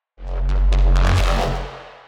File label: neuro, bass